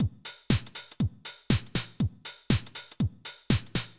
House Drum Reverb

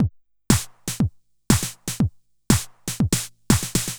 House Drum NoHH

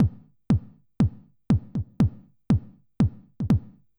House Drum Only BD Reverb

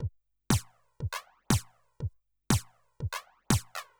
House Drum No HH Flanger

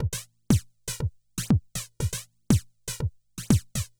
House Drum No HH Flanger

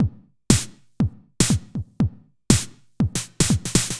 House Drum NoHH Reverb

